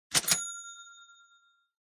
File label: Cash Register Drawer